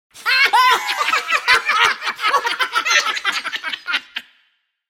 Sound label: Cartoon style group laughter.; Funny Sounds; Comedy